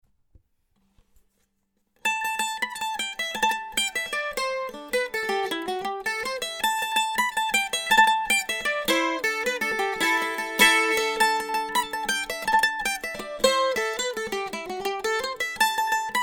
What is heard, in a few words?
mandolin